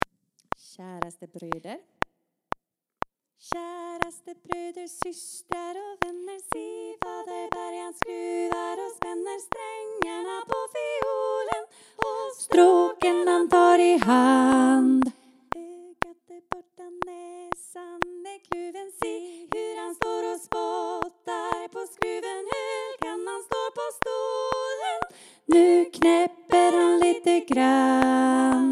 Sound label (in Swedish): Käraste bröder baryton; korsang; seniorkor